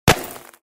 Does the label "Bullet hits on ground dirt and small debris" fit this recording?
Yes